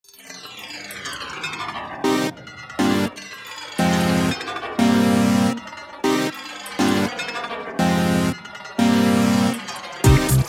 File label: video game